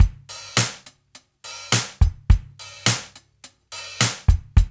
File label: DRUMS, Funky Ass Loops; East West